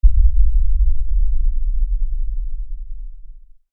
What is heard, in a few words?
LFE Tremor, Lfe Tremor Low Bass Shake Rumble; Blastwave